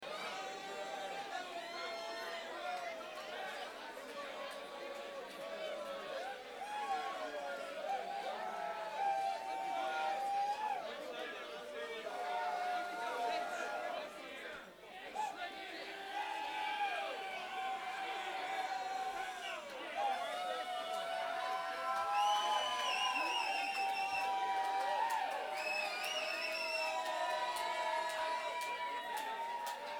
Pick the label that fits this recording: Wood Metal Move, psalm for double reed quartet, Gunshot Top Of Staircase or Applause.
Applause